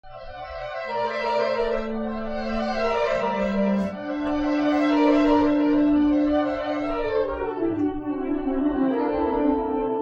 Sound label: flute